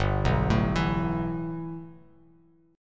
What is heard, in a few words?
G 6th Add 9th G Bass Standard, chord; G6add9 arpeggiated